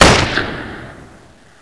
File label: sniper; axis; WAR